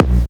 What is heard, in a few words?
Reverb Tail, Kick Drums; Zero-G; Soundclash